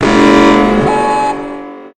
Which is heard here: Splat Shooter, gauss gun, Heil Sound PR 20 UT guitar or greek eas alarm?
greek eas alarm